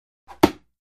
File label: Darts; Dart Throw With Feather Whoosh. Hits Target Hard.